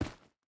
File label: footstep rubber boots stones run; Rust